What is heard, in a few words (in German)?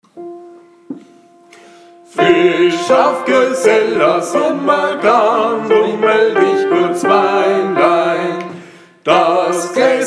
TENOR; Gesang; Der Tummler